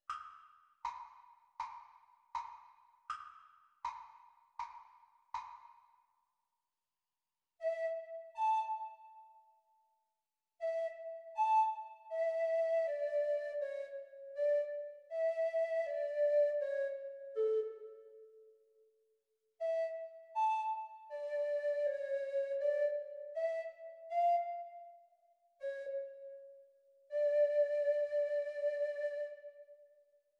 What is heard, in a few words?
Treble Recorder; Grade 1; Practise speed